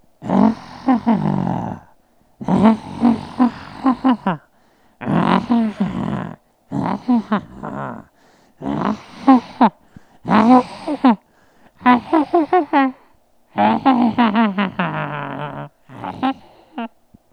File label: cartoonish-like